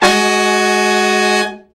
BRA SECT; BRA SECTIONS

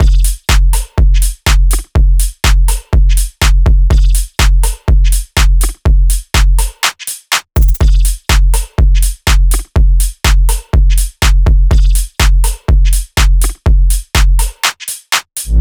loop full 123; Seismic Loops

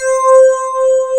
pluck C5, FM pluck

